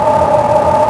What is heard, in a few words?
fast, engine; Quantum Redshift; XBOX; kaitlyn